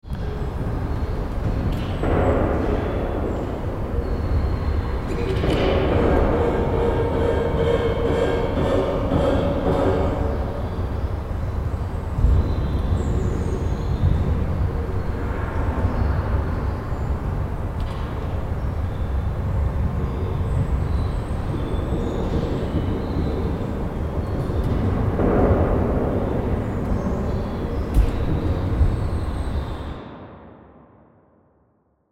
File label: Ambient Sound Design